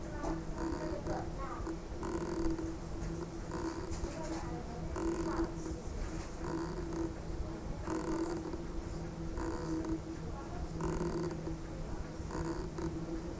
Practice with sibling noise

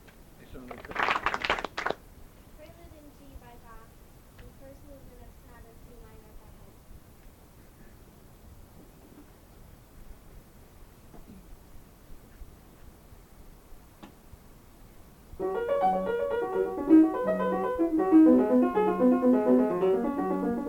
Prelude in D - Recital; Bach

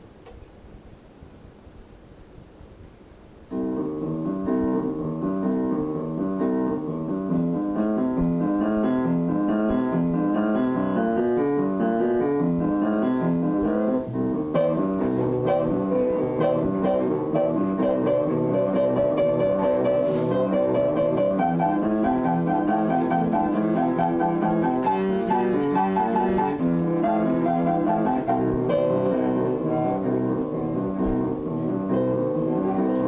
with bass

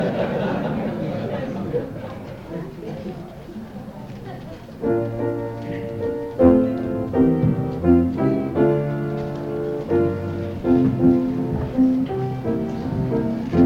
audience singing; Dec82 Columbus Country Club

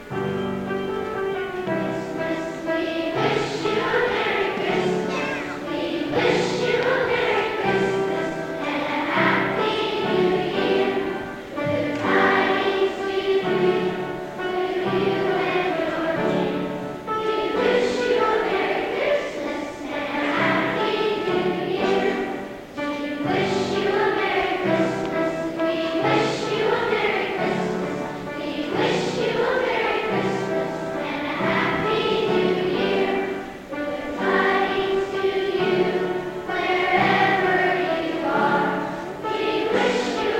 Wish Merry Christmas piano

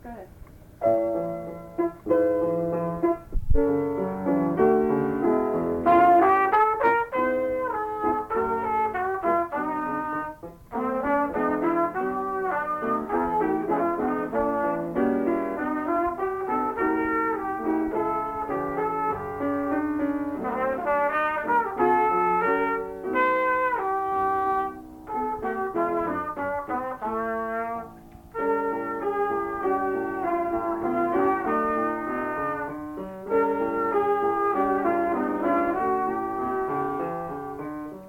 Trumpet

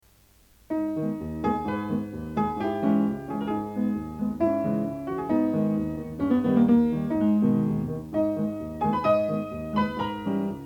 Hungarian Dance #5 Duet

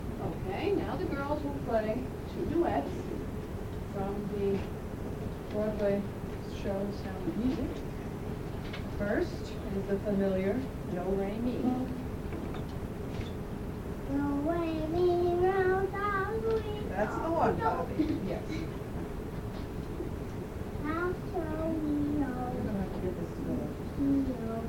Practice Minuet Do Re Mi Duet

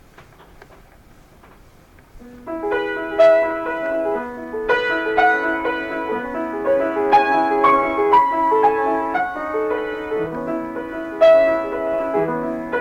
Piano

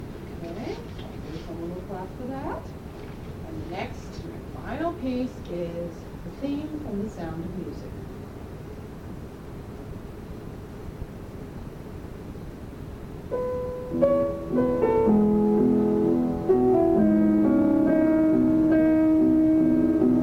Practice Minuet, Duet

